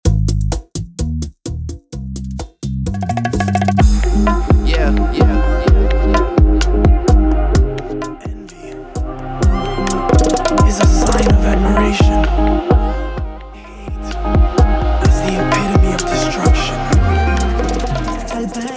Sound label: Intro Acapella Outro 128BPM